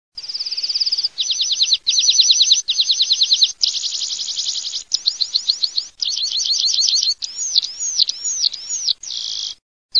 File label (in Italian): PISPOLA GOLA ROSSA